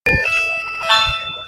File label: magicband rfid scan sound captured in the wild; disney mymagic